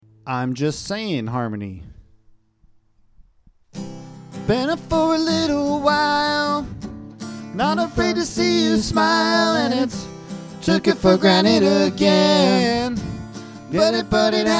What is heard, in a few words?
harmony; Tascam